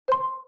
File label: timer start; pat & mat game; UI